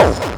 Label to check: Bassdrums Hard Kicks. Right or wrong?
Right